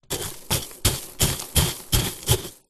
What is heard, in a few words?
foley glass pieces thousands small broken wood dig in stabs plastic tub